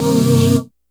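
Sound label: dance chord; E-MU Formula 4000 Series Vol. 2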